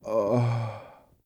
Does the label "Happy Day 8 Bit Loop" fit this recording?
No